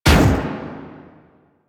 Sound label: Super Weapon Bomber Explosion; Warframe; Caliber Chicks